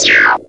Arcade Riffs

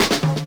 Minimal Fills; Minimal Loops

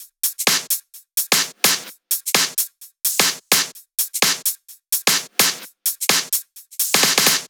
128BPM Kit; Praetor Kit

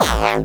Bassdrums Hard Kicks; Vengeance Samples

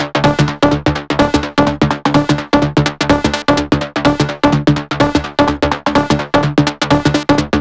Melody 2, 126BPM; Vengeance Samples; Thommy Kit

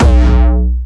B1, Jumpstyle Kick; vengeance essential club sounds-3; VEC3 Bassdrums

